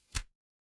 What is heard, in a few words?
paper fabric page turn